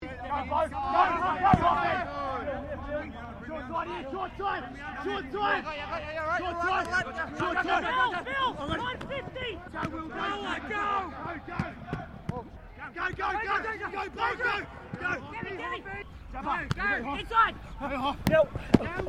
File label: RUGBY LEAGUE TEAM TRAINING; Various rugby sounds; Miscellaneous Sports (Sound Effects:Sports)